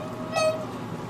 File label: cat meows underwater